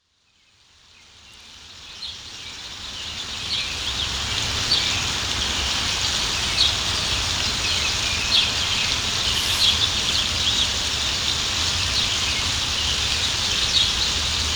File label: bacon rain